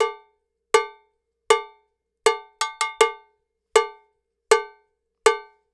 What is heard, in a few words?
160 Dance Cowbell; Dance DJ; Percussion; BellCowbellAgogo; Pro Tools Essential Loops; Digidesign